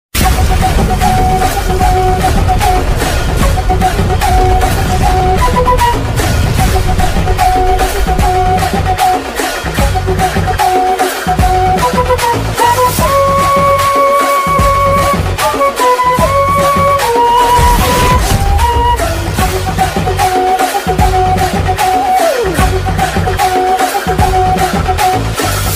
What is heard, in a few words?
ringtone bgm; maanaadu